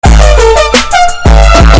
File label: bass boosted